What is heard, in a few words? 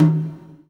GATE TOM, Drums Acoustic